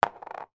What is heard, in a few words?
die place on playing board